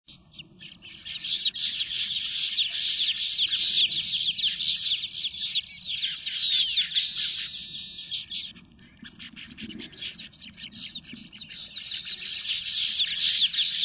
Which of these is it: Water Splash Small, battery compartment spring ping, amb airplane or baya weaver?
baya weaver